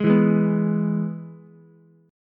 Ab Minor Sharp 5th, Ukulele; Abm#5 strummed; JGuitar

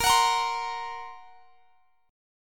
A sharp Suspended 2nd Sharp 5th; A#sus2#5 strummed; x,x,20,23,23,20

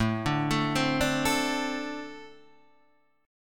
A-Minor Double Flat 5th A Guitar DADGAD

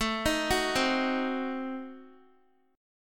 A Diminished 7th, Guitar; x,12,13,11,12,x; arpeggiated

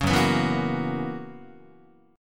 F sharp 11th F sharp Guitar; F#11; strummed

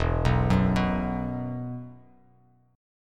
E Suspended 2nd E, down, Bass Standard

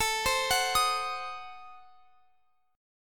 A Diminished 7th A down Guitar Open F; arpeggiated

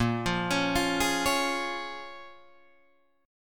Bb Minor 7th, Guitar, Drop D

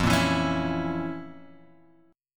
F Major 7th Suspended 4th Sharp 5th F; FM7sus4#5 Chord {1 1 2 X 2 0} strummed

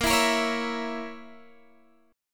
Bb Diminished Bb down Guitar Open G

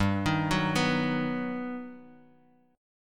G Augmented Major 7th; G+M7 arpeggiated; JGuitar

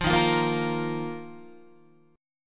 D sharp Suspended 2nd D sharp, Guitar; strummed; chord; JGuitar

